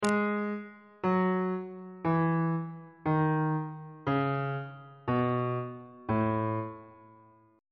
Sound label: a lydian mode descending